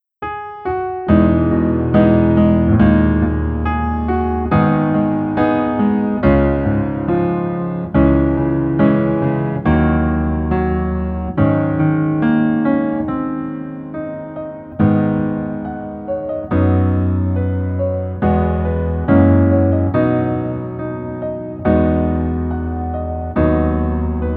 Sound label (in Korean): Piano ver 멜로디MR -1키